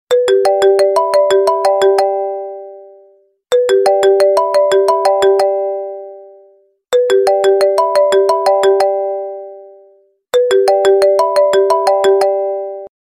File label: iPhone 3D